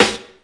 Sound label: clicky acoustic snare sample f sharp key